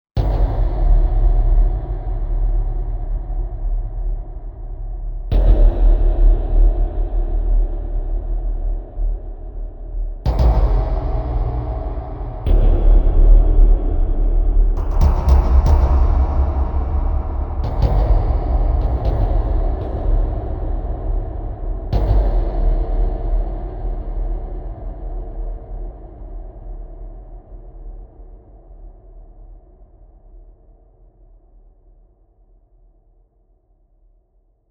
Impact

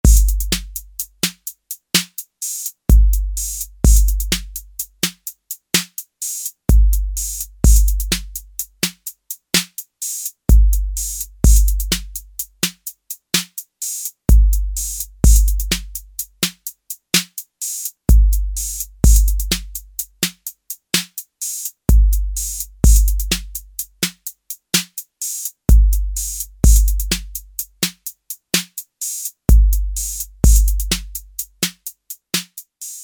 ROCK, Roland TR-808; Factory

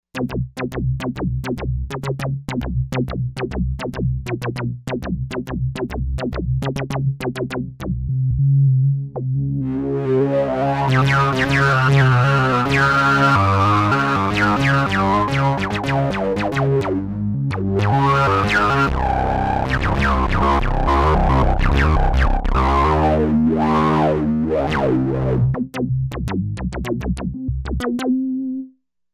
Bee Bass

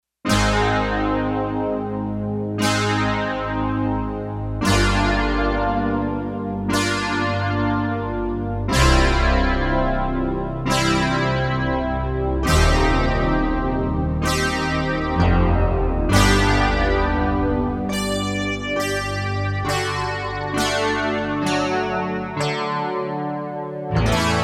Guitars

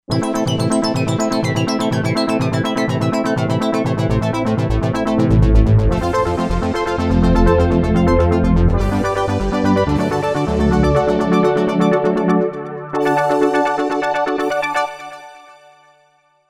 Sync Chords

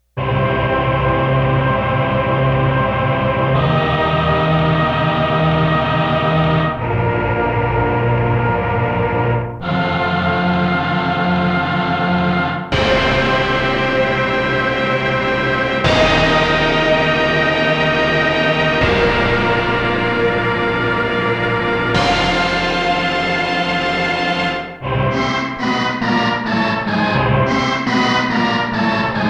CHOIR ORCH HIT ORCHESTRA HIT

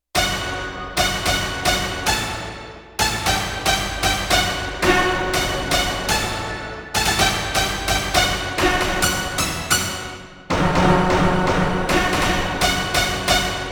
Ambient Hit, Roland VC-1

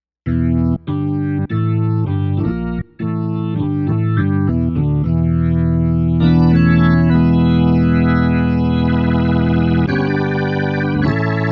Or Big Rock

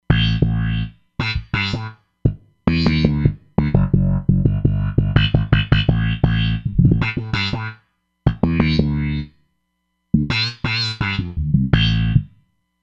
Filter Slap

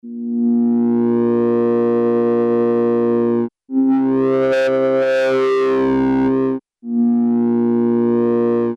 Swell; Alesis airSynth; Oberon Swell

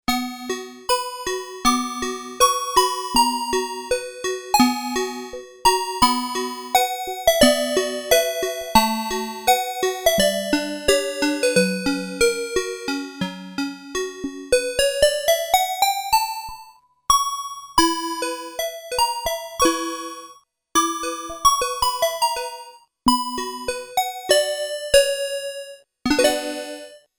TOY PIANO, Korg DW-8000; Factory Patches

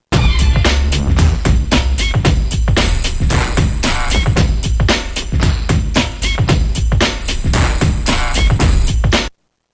Tune Up; Roland M-DC1

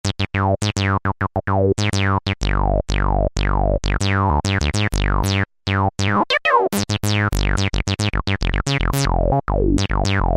Bassline

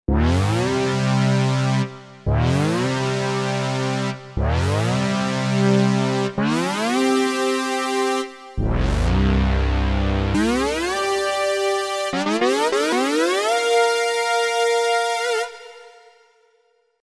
Pitchin' Up, Oberheim OB-8

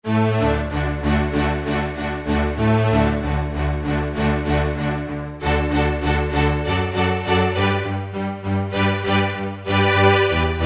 Strings, Roland U-110; Factory Patch

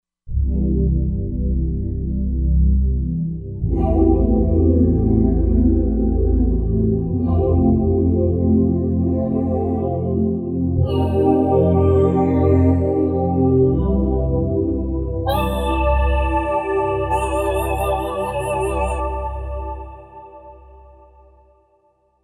Digitvox

